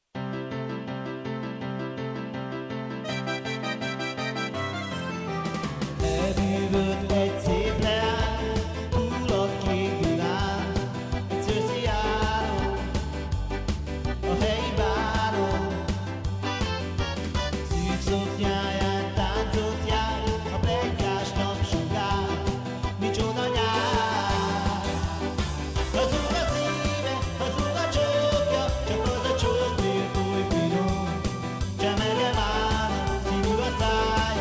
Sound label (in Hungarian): tanc